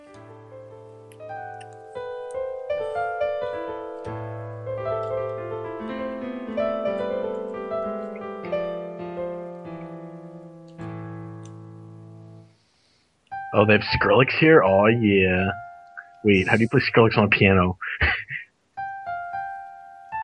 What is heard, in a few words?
piano